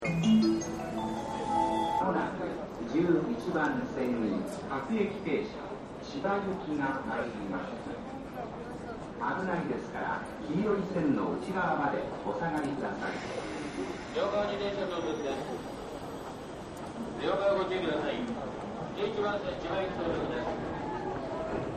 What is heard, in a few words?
Tokyo Train Station; Cities; Tokyo Train Garage Band; Train Stations; Partners In Rhyme